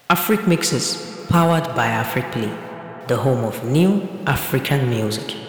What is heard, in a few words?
Jingle